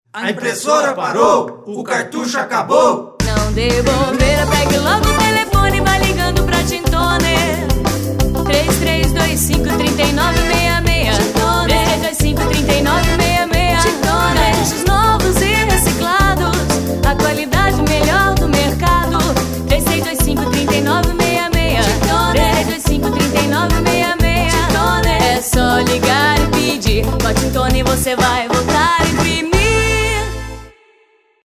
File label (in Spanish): studio jingle comercial; tin toner